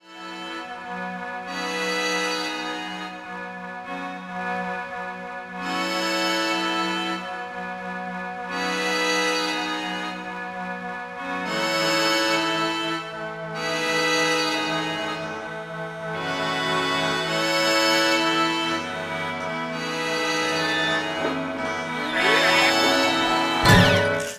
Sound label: String